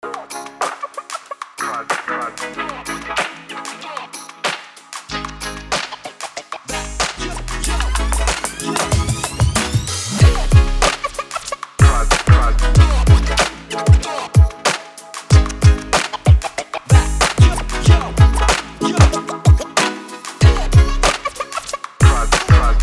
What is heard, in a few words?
The Hip Hop